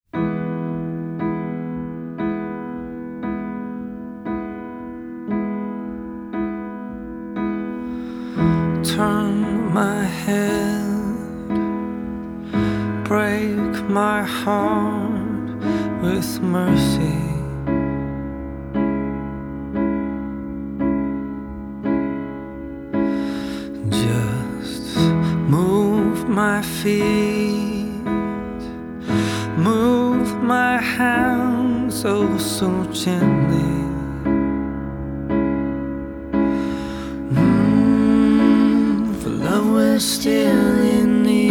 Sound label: Acoustic Version